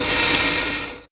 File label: Air, Train; OpenBVE; Bzmot